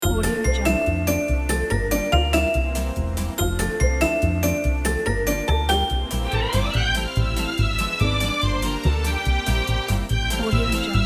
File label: vintage sitcom logo